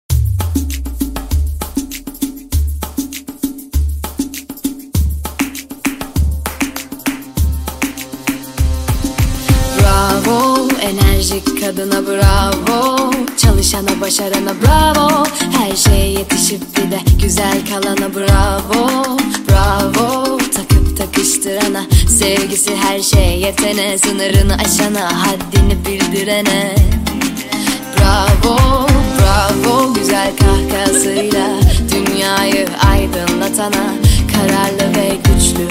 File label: Music Turkish